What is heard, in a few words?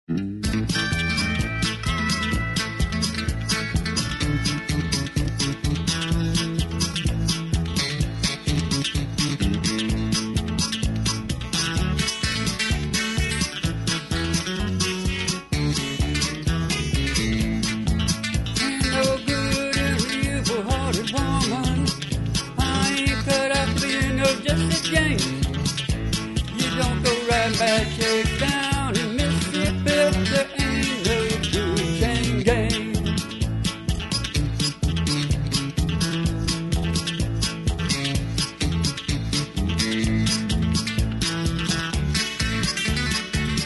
no good chain gang background vocal